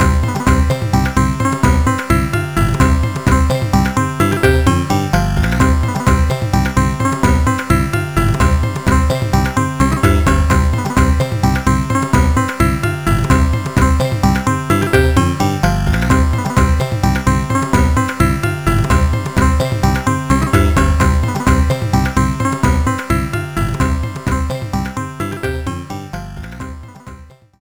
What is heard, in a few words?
Stage Clear; Rakshasa